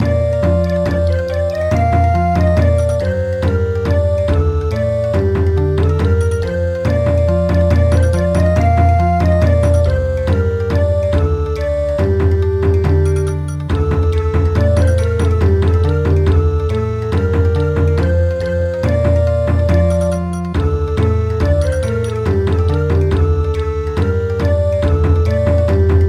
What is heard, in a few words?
Bon Odori; Ukraine has not yet perished